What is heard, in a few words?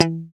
GTR Funky Strat; Funk & Mute; Club-50 - Foundations